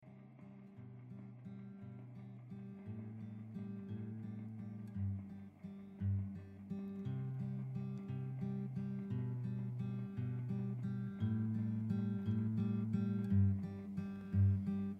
angenehme musik in love; Life is Strange 2; gamesoundopedia

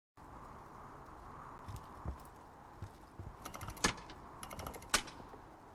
schalter kann nicht benutzt werden; borderlands 2

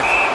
pfiff; national hockey league 2019

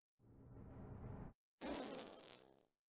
character selbstzerstoerung; battleblock theater

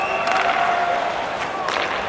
schnelles passspiel; national hockey league 2019

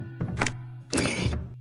platzieren; We Were Here

